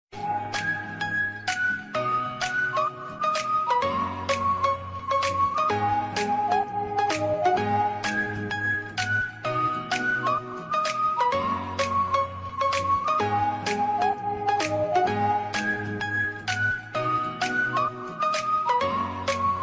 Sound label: npc music; goofy ahh